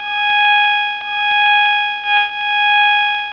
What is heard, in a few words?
Combat Start; Ultima III